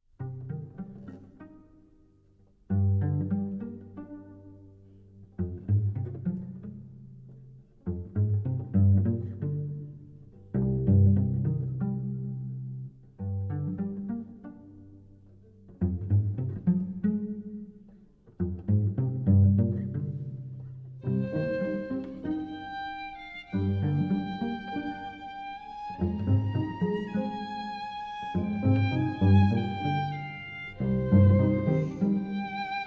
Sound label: Preludium C-Moll